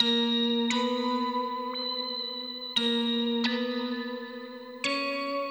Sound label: Hip Hop and R&B Drumloops